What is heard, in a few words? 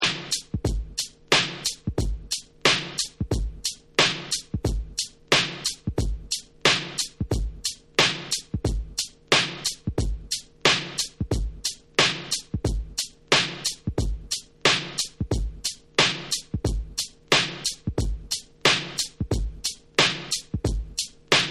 Breakbeat